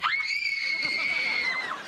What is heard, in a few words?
screaming